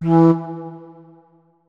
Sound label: flute notes; Hideout Furniture